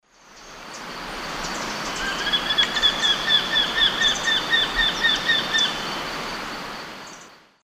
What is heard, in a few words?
Falco deiroleucus, flight calls; S. America; Eastern Lowlands